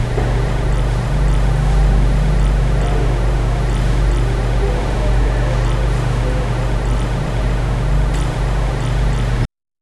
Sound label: palace hallway ambience